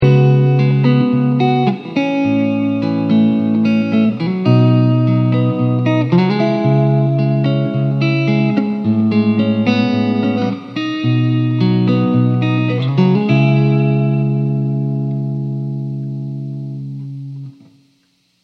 room, guitar effects; rv5

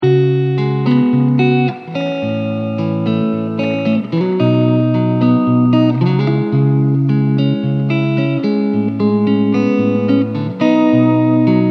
spring, guitar effects; rv5

